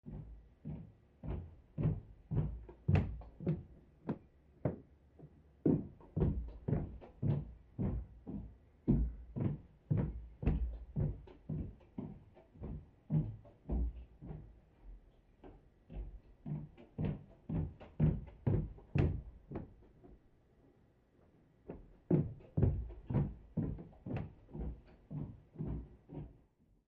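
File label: Footsteps Female Walking Fr, stairs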